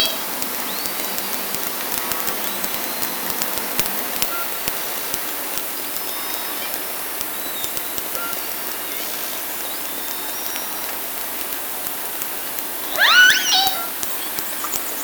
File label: Orca sound Night Passby